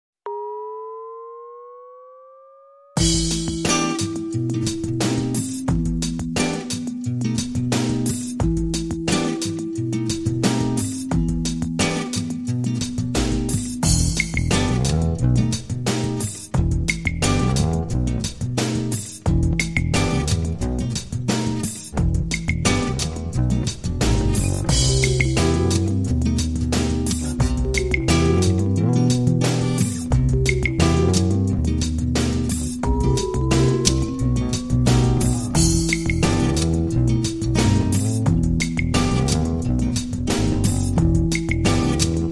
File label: Acid Jazz